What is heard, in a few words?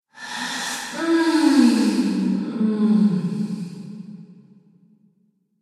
Femme Fatale Moaning With Reverb; Sex Sound Effects